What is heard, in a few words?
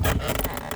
creak, movement; snapfire